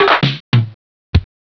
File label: Percussion; chipmusic; sonix